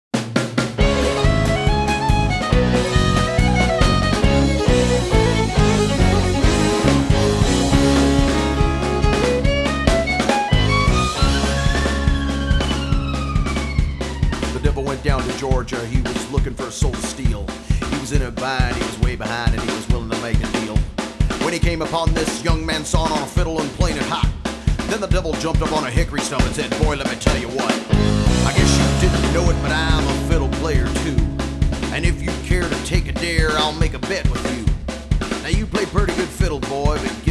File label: Cover Band